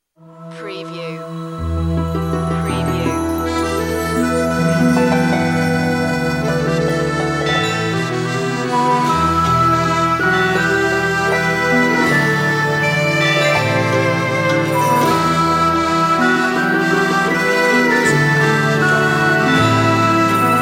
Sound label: jingle bells celtic